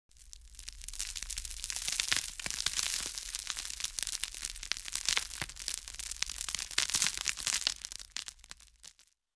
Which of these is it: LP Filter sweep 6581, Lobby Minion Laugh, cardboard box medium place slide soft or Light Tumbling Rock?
Light Tumbling Rock